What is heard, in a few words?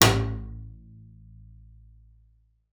A1, bass pizz; Xsample VOL-5